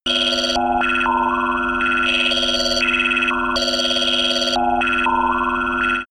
alien computer

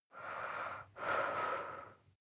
breath female normal

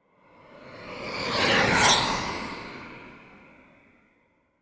flyby

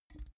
big can empty pick up

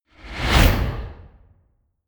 whoosh

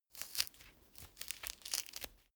mandarin peel sequence

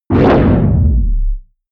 whoosh distorted rip bass

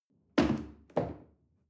chair wood place soft

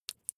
flesh wet greasy squish small

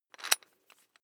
electric drill bit fasten wrench turn loose

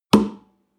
western guitar drum single clap

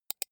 computer mouse right click soft fast

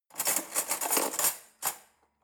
cutlery forks drawer grab soft

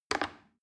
plastic button little click regular fast

